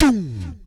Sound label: VOCDRUM, MOUTH PERC; Best Service; Hyper Dance